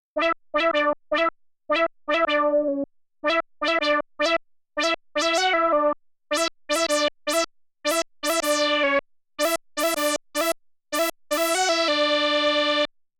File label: Lead Minimoog